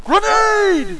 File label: allied grenade; dod